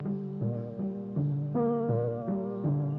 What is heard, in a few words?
Lofi Piano Loop 80 Bpm